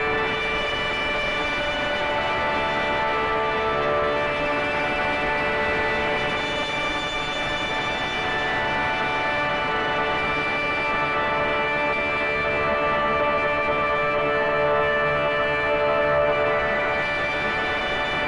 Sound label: Keys Texture, Indie Rock; Sonic Foundry; Indie Rock Anthems